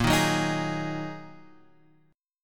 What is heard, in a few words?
A sharp 7th Suspended 4th Sharp 5th, guitar; A#7sus4#5 Chord {6 6 4 X 4 4} strummed